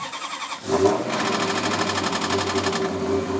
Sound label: maserati cold start